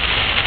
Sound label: Static; Mosaic Magic